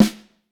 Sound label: Vintage Snare Drum; Q Up Arts; Johnny.C's Vintage Snare Drum; 5.5X14